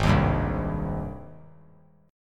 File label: Ab Major 7th Ab down 3C 3F 3A 3D; AbM7 strummed; JGuitar